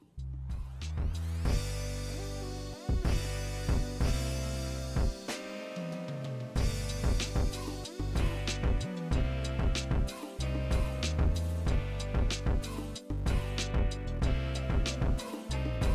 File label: Tidal Waves Instrumental